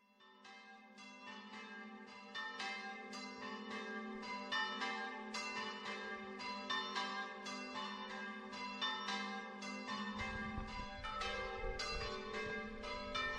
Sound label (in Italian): campane; COLLOREDO DI MONTALBANO